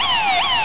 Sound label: siren